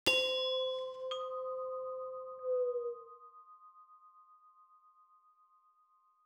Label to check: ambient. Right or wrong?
Right